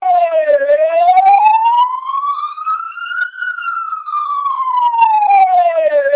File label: siren twelp